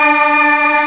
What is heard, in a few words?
MELLO CHOIR; WORS STATION; DANCE #3